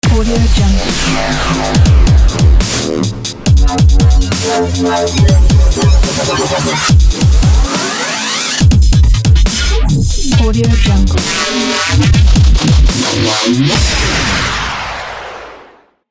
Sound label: mechanize dubstep ident; aerkraft